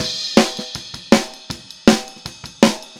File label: Snare, Drums; Drums from the Big Room The Mixes; Take Your Toms Off; Chorus 03